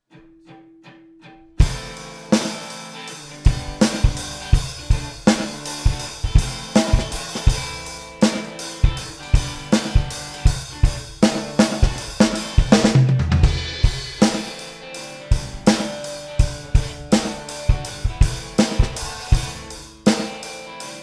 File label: drum sample